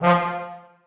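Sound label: trombones staccato e2; Sonatina Symphonic Orchestra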